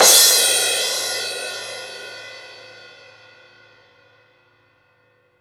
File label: cymbal, deep house single hits